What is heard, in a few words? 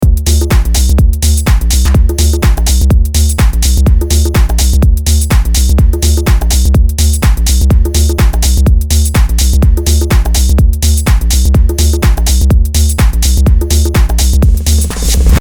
Original bassline